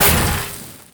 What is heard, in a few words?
Laser Impact, Weapons